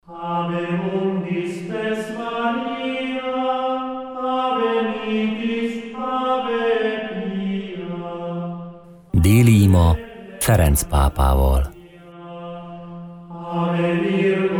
Regina Coeli Jingle; Papai Angelus

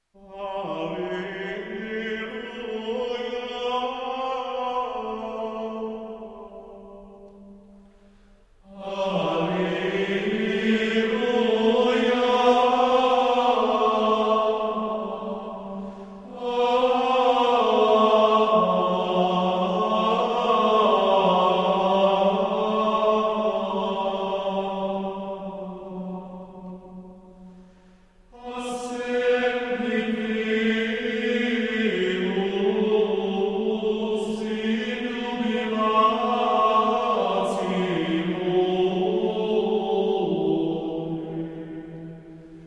Choralschola Alleluia, Gregorian chant; Ascendit Deus

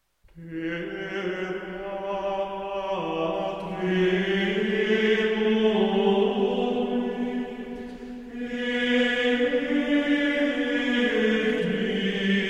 Choralschola Terra tremuit, Gregorian chant

